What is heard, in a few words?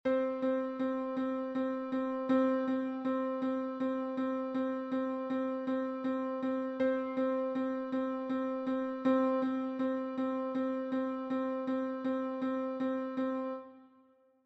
rhythms